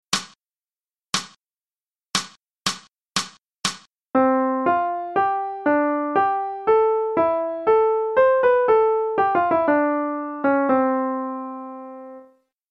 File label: Transcribing Rhythms; non-swinging